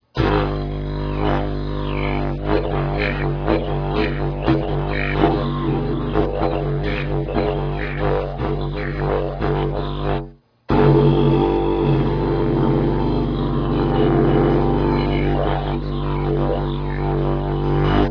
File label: didj; didjmaking